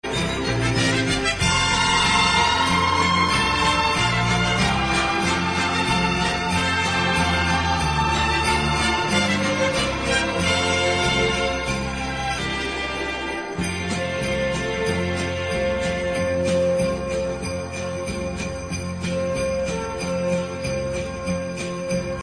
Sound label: christmas tune